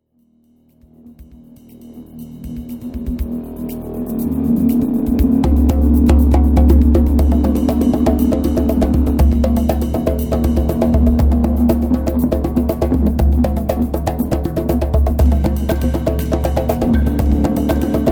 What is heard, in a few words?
funk, drummer